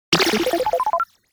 Casino slot machine bonus win

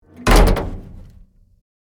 Tank hatch closing

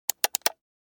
Multiple click

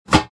Cooking pot lid close; utensil

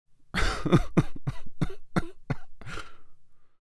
Deep male crying

